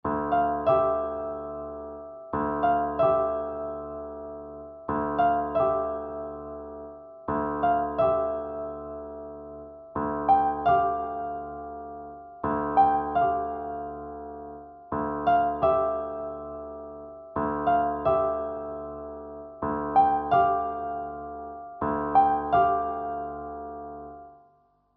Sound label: piano, ambient